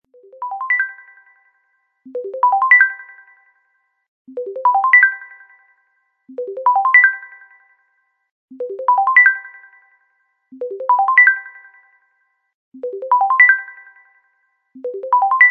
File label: LG Bubble, Ringtone